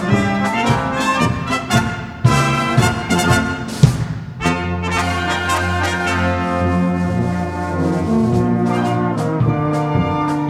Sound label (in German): Polka